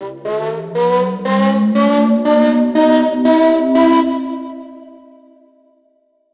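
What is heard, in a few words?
Reverb Proc, alarm siren samples, 120bpm; musicradar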